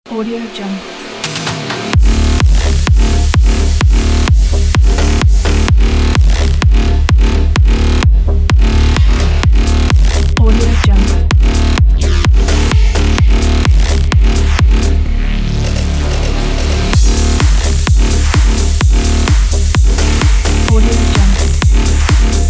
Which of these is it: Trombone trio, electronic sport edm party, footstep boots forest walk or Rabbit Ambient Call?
electronic sport edm party